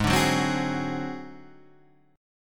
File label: G sharp 7th Flat 5th, Guitar, Half Step Down; chord